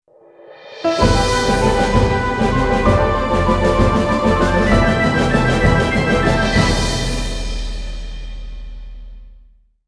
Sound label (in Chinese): 大气的背景音乐